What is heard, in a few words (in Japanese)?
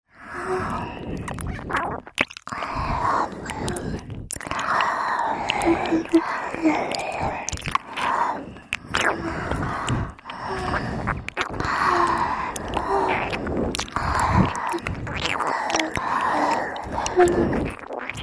両耳舐めループ（通常ver）